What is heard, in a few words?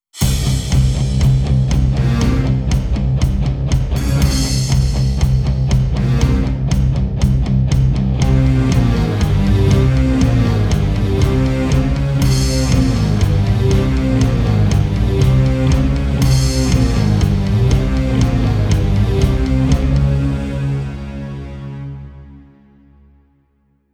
Start Running; prince of persia the sands of time windows gamerip 2023